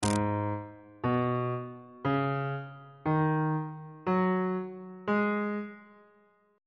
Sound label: g sharp minor pentatonic scale on bass clef